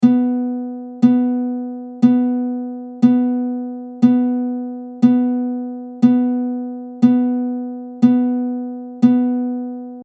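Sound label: DGBE B, ukulele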